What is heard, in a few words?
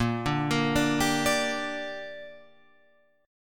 A sharp 6th, Guitar Open F; arpeggiated; JGuitar